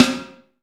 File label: MIX SD; AKAI S6000 CD-ROM - Volume 1